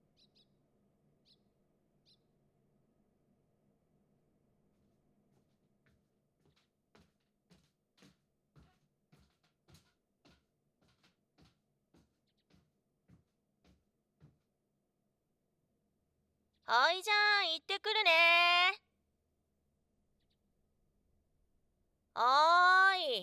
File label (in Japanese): こたつで耳舐め, ASMR; 下宿先のリビングのこたつで だらだら ぬくぬく攻められ耳舐め; アダルトパート